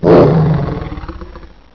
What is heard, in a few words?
CAT ROAR